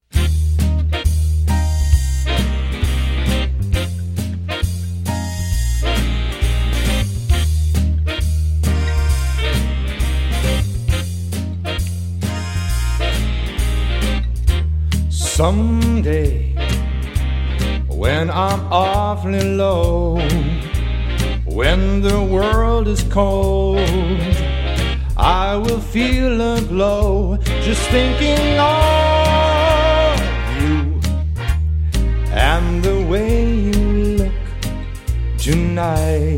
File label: Variety Duo; Boston